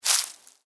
Footstep: Individual Footstep On Dry Leaves

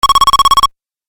Retro Pong Tennis Hit; Video Game Sound Effects

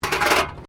METAL BINLID SCRAPE WIRE FENCE; Materials

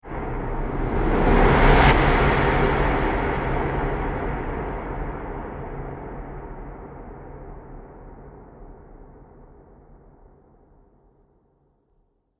Trailer Riser Epic Fallout; Trailer Sound Effects

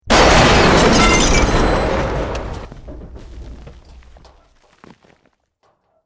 Building Collapse Medium

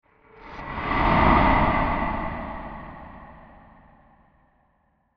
Deep Breathy Wind Transition With Reverb; Scary Soundscape Sound Effects

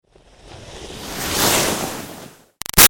Skiing By Fast Snow Long Up; Skiing And Snowboarding Sound Effects; Sports

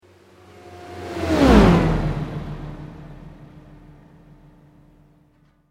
Sweep Mechanical Slow

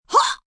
Grunt Breath Hoah, Grunts, groans, impact, female; Groaning; VoiceBox

